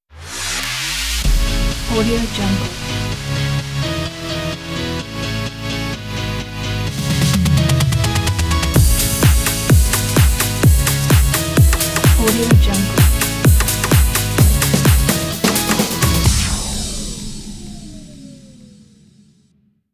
dance logo pack

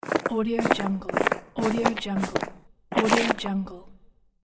outdoor footstep gravel; peterkovac-svk

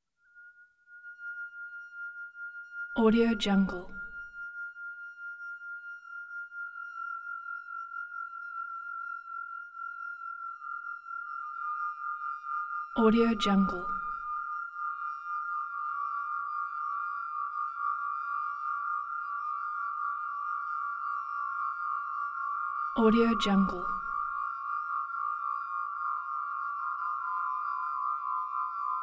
magic synth tones

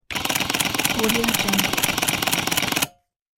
electronic calculator

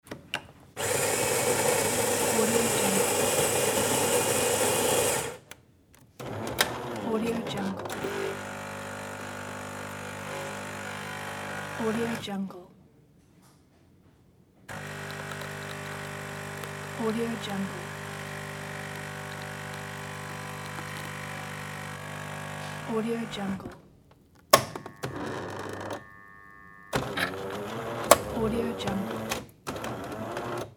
coffee machine

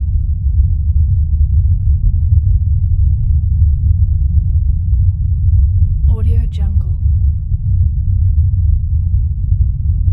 deep space ambience drone

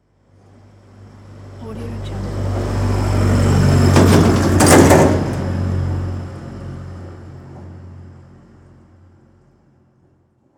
lorry pass by

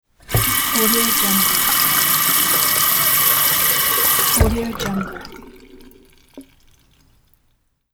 water faucet on off

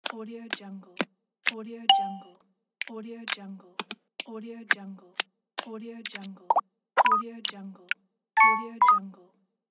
click switch; click switch sound pack

